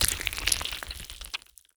bloodloss runs; OldThink

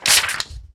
paper drop; Items handling

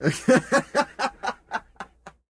laugh, Voice Human Male

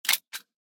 drop, Pistol; Items Weapon

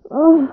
under moan f; Interactions

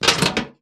toolbox pickup; Items handling

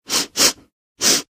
snif, Sniffing; Interactions

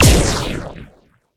cast, Magic; Magic Force

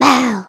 death; Gnomes; OldThink

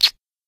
kiss; Interactions

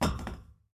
catwalk, Footsteps; Effects; OldThink

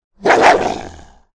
bark, Barking; Voice

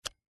eject module, Gun; Modules

